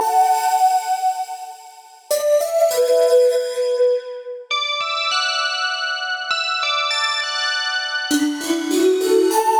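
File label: D major Phrase Blow Pipe; Zero-G; Future Wave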